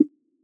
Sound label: hover